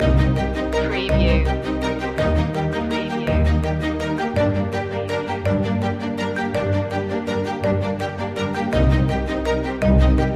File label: stirring times loop